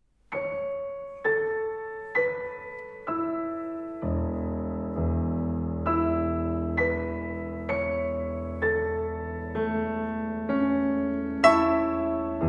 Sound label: The Bell Tone Scale